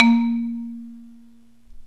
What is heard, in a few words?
MARIMBA VIBES